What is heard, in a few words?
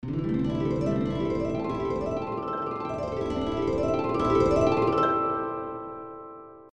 Harp Griss